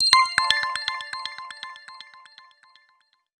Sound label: Heat alert; PlusFourSix; Brittany; Paul Bergman